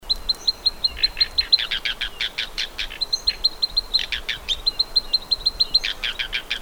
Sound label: Collared Bush Robin, birdcall; Tarsiger johnstoniae